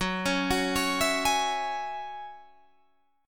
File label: F sharp 7th Suspended 2nd F sharp down Guitar Open D